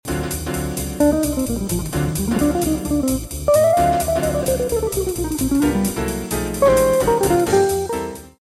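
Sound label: minor altered, guitarist, jazz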